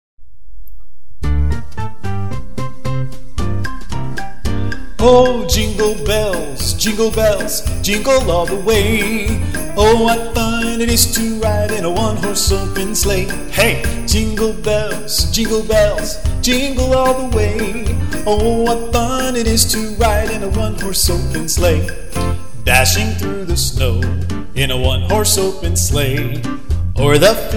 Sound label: jingle bells; xmas songs